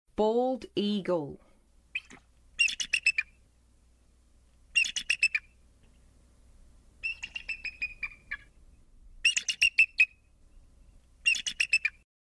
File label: Bald Eagle; North America